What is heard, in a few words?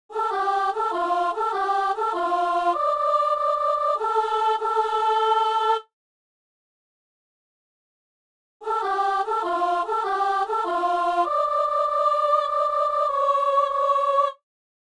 soprano; Ah ! Que nos pères étaient heureux